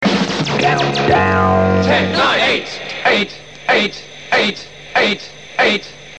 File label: Countdown Jingles